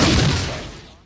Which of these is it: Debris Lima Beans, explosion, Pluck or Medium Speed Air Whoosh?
explosion